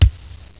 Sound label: KICK